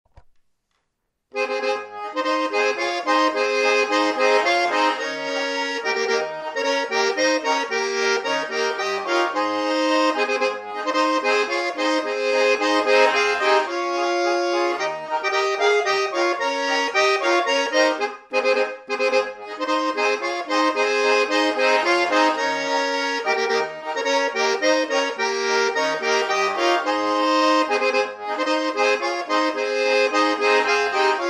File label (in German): Marsch